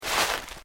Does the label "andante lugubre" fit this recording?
No